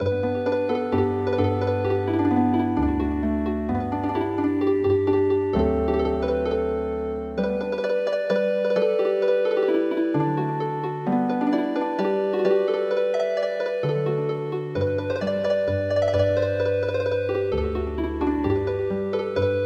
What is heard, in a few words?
Healin Harp; ASAWA DOKOKARA